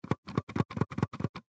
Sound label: Thermostat Button, Thermostat Button Press Multiple; Appliance; Household; Appliances; Blastwave